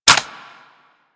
Weapon Jam Hit C; Grineer

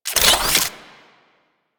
Jet Turbine Pistol Equip; Warframe; Tenno

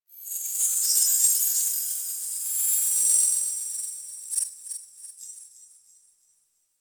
Tech Texture Small, Ambience; Warframe; Cephalon Weave; DE

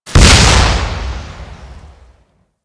Swarm Missile Explosion; Warframe

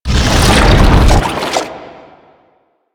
Octopede Lash Limbs Whoosh; Warframe; Man In The Wall

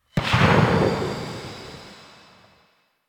Saw Blade Launcher Tail; Warframe

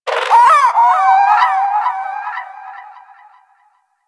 Bird Captured Lift Away; Warframe; Hunting Critters; Sawgaw

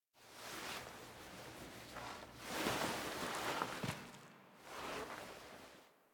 Foley Get Up; Cinematics; Operator; Warframe; The War Within; Mountain Pass

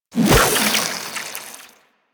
Infested Fishing Spear Line Retract; Warframe

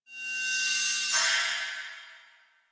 Glyph Prism Laser; Warframe; Duviri; Glyph Puzzle

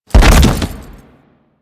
Light Fall A; Warframe; Grineer; BodyFalls

